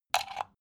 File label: socket plug hard